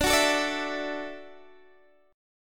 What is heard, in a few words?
D-Major Add 9th, D, Guitar, DADGAD